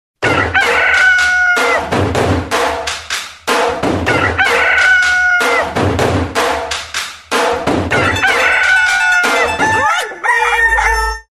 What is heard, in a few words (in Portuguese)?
toque do galo acordando